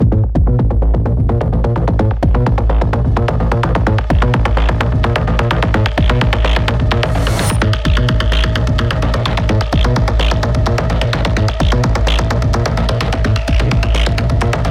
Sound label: PVP Sudden Death